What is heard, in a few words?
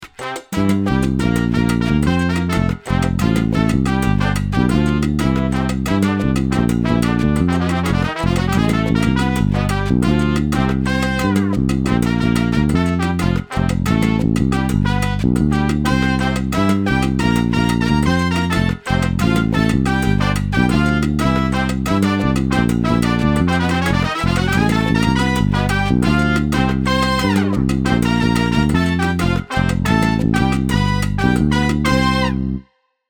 mambo bounce